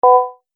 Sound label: Multimedia Verified, beep; Beep Sound Effects